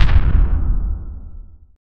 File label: heavy metallic crafting